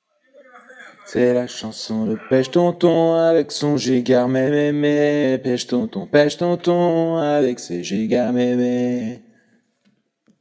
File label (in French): Sonorité agressive C Majeur